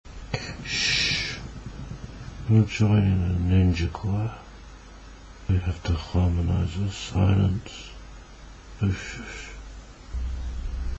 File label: ninja Choir